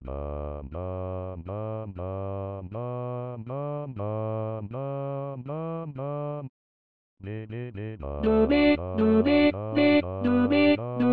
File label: TENOR